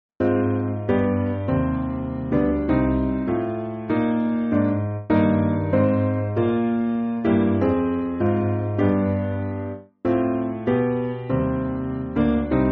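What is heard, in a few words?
Piano, Backing Files for Churches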